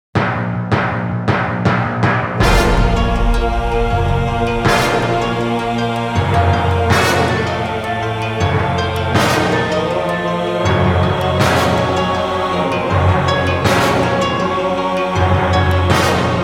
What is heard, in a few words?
Knight Fighting Long; Inmost